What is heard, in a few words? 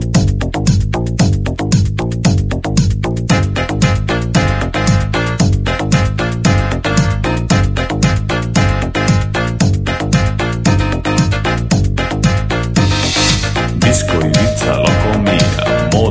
Song Loop; Latin